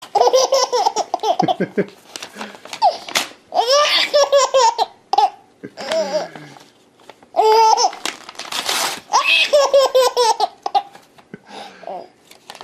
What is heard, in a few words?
baby laughing